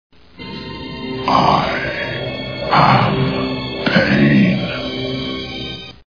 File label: pain